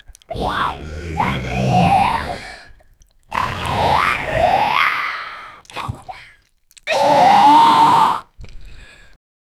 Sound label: aquatic angry beast short